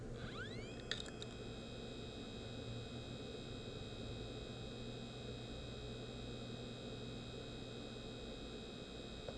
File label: hd sounds, laptop, bad; mac disasters